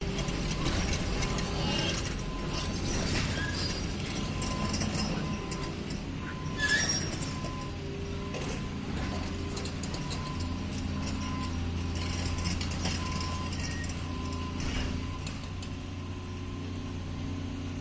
excavators contruction site moving idling digging; your free sounds

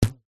foley elastic band fire flick shoot

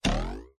cartoon twang spring ruler ascending tail

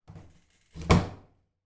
household door close internal wooden bathroom

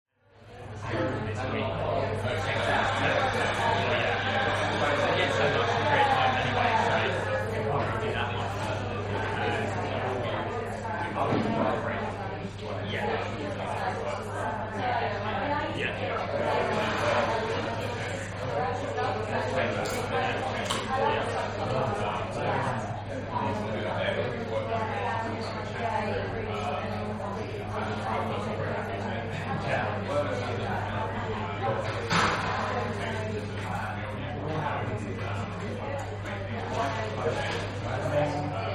London Cafe Small; noise creations

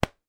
office laptop lid down

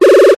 multimedia game sound error tone

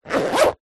pouch small zipper zip fast hard; zapsplat; foley

